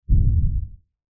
Monster Footsteps, Horror, Monster, Footsteps, Lfe, Hit; Monsters